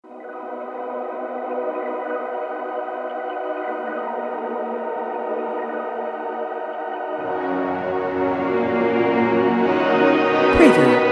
Atmospheric Ambient Pads Relaxation Meditation Sci-Fi Relaxing